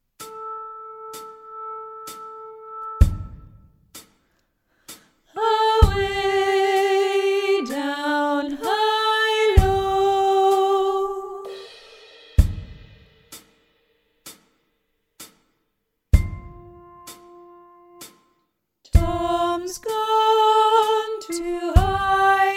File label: Guide Sop; Hilo; Tom's gone to Hilo Soprano singalong track